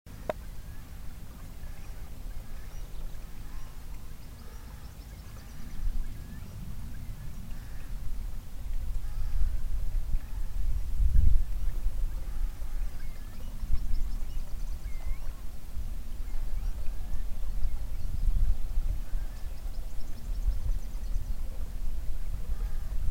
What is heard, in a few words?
curlew